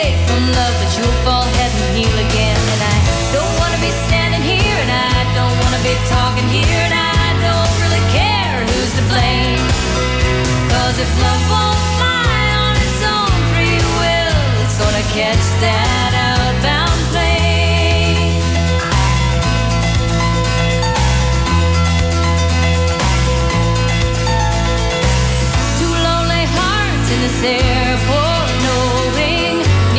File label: country